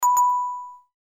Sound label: game show bell ring